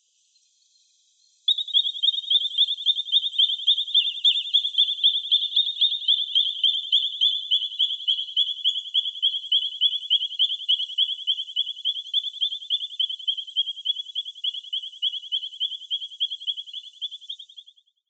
ikaru chidori

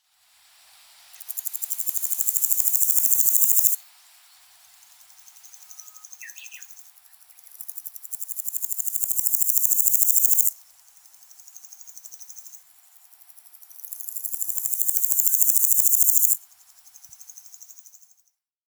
naki inago

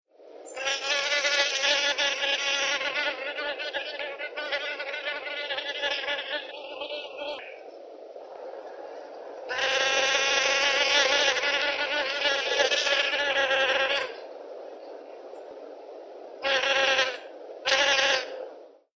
ashibuto hanaabu

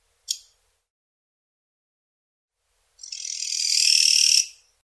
kirigirisu

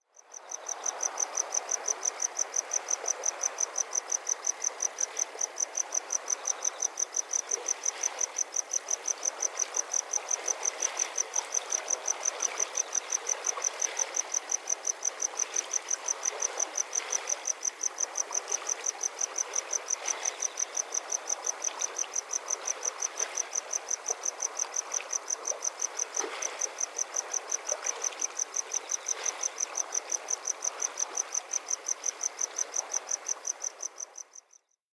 kamado

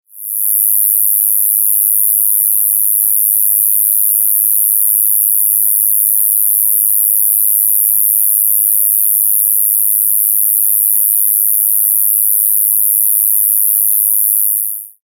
kubikiri

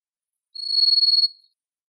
suzumushi yobinaki

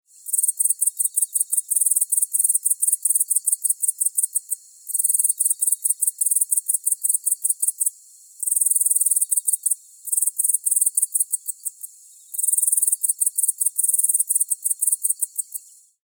midarenaki yabukiri

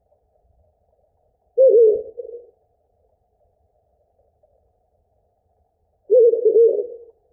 fukurou

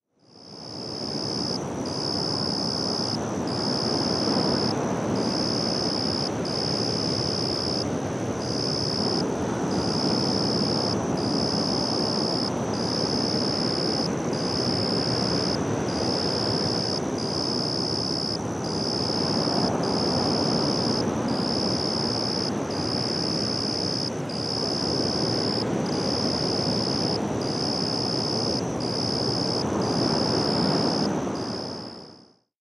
hama suzu

